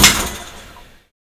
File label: riverbarge electric motor stop